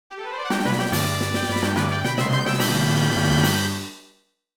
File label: Fanfare - Winning; Pokémon FireRed and LeafGreen Remastered